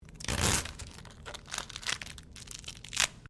Sticky Tape Rip Screw Up; common tape; Offices

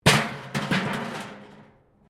metal rubbish bin smash concrete; Metal (Sound Effects:Materials

